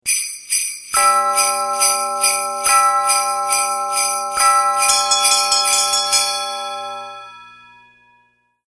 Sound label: Alarm; Treat of the Day